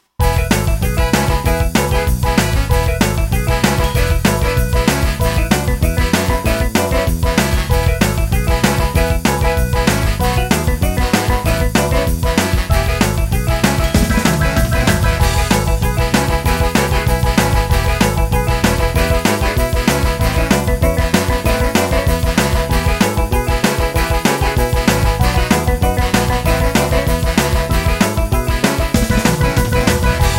50s rock

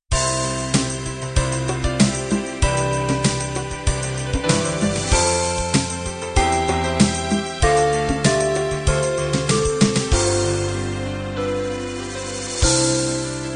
love ballad end

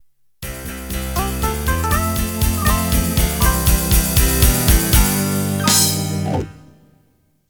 6/8 12/8 Ballad Intro

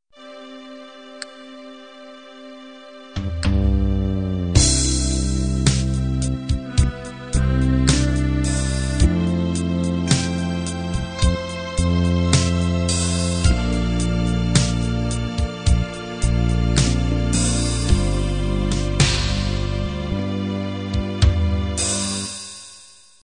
halftime ballad intro; HalfTime Ballad X & HalfTime Ballad Y compatible MIDI file Intros and Endings

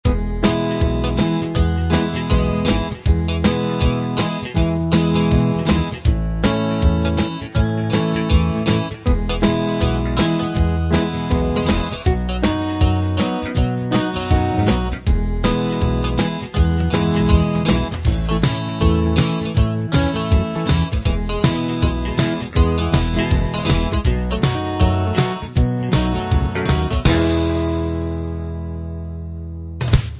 shuffle; Works for: C&W, POP, ROCK & CCM

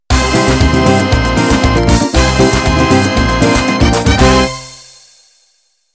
Gypsy Pop End

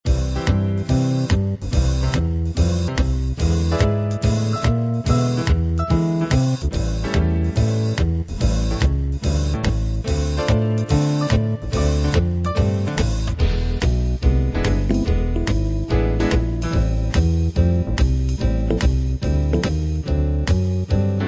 Cool Jazz

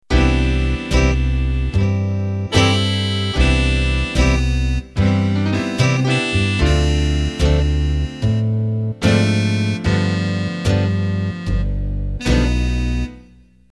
Band Intro

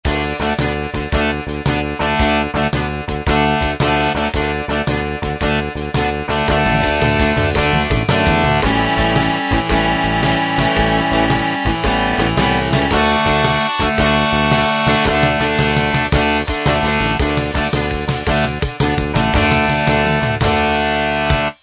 rock shuffle

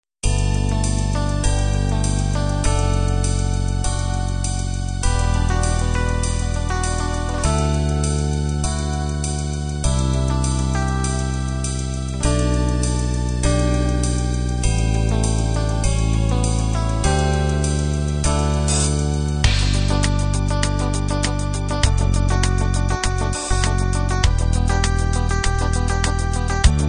windy beat

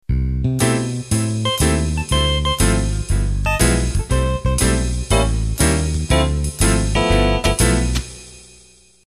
Ballroom Swing Intro

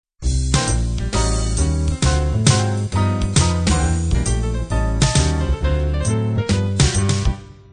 cool jazz intro

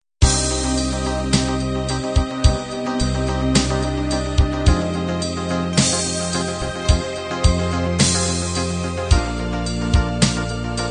halftime ballad end

